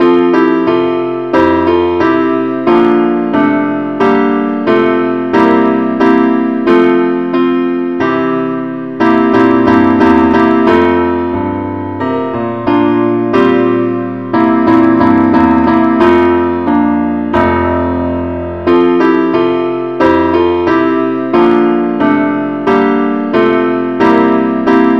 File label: keyboard Only key f; Welsh tune; Gwalchmai is a Welsh tune, named for the town of Gwalchmai